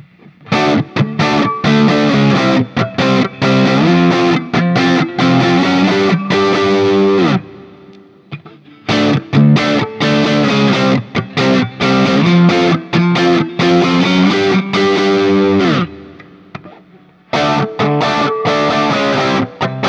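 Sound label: Guild X175 MS JCM800 A, guitar; Guild Newark St X175 Manhattan Special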